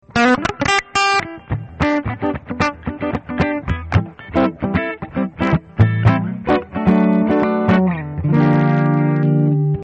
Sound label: gibson bridge clean; Bridge Pickups, CLEAN